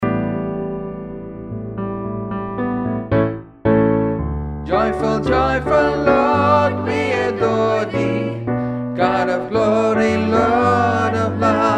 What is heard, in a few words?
Joyfull bas